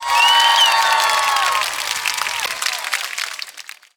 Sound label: cheer; pictcode